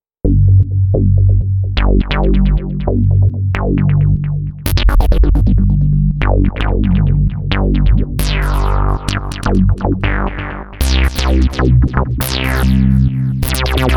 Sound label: Velocity filter basses